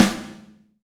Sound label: Rock Snare, Roland BK-5; Snares